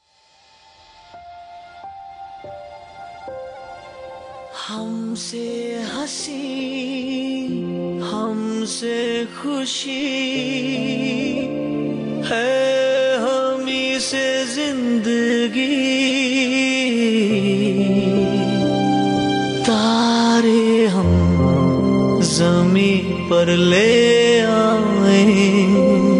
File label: Sad Title Song